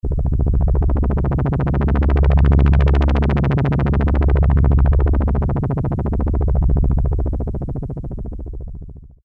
Helicopter, Korg Poly-61M

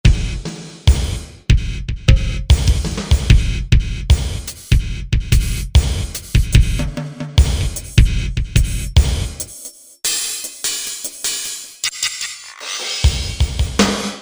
gated drums

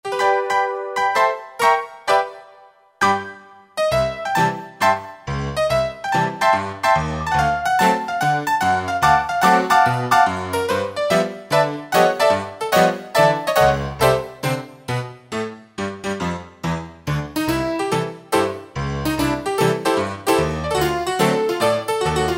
Honky-tonk, Roland SC-50 Sound Canvas; Factory sounds

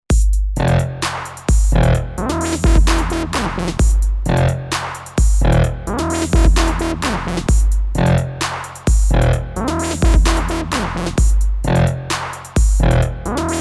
Trap

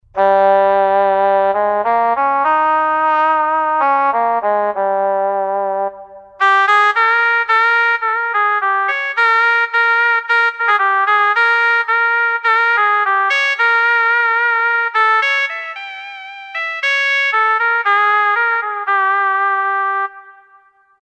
Suona; World; Roland SR-JV80-14 World Collection Asia

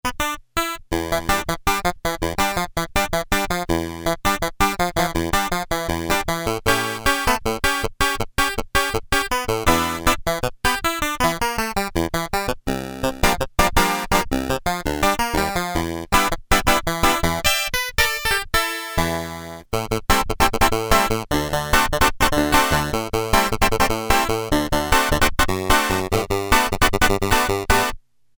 Funky Clavi, Casio MT-600; Tones

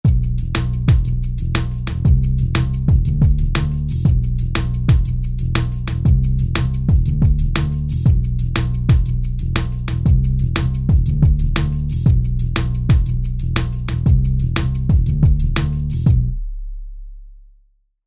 Pop

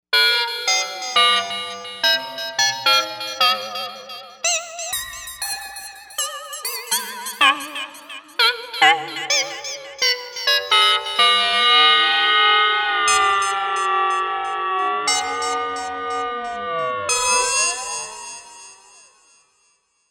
Bell, Novation UltraNova; Factory Patches

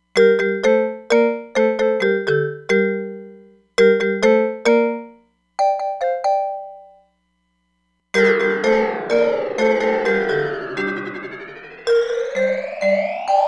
Rise & Fall, Roland DEP-5; Factory Patches

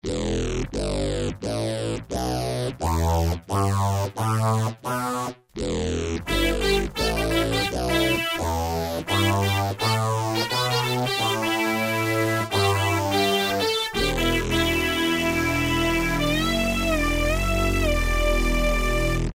LIVE LEAD, Casio VZ-10M; VZ ROM Card

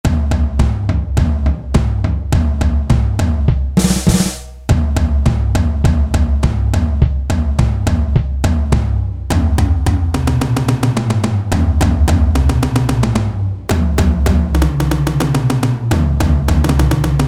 RockOn Kit, Roland SRX-07; Roland SRX-07 Ultimate Keys

